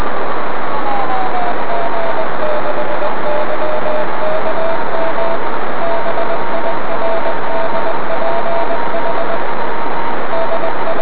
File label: birds